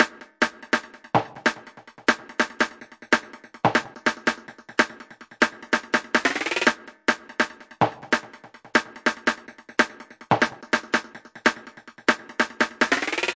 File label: pitched snare journey, drum loops and breaks; 141 to 160 bpm